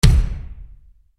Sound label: SUPER BASS-D, Yamaha RY-30